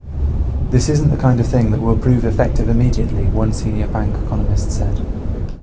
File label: Reverb far